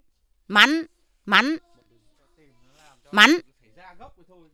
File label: sniff smell